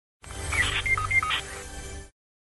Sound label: radio beep ringtone; fortnite